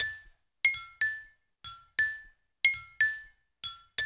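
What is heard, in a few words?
120 bpm, industrial udu; Spectrasonics - Supreme Beats - World Dance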